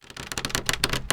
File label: Longbow Pull Back; Timelock VR